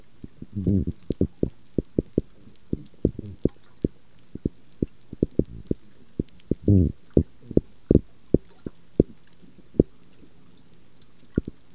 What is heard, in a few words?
hornby island fish sounds